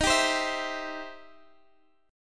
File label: C Major D sharp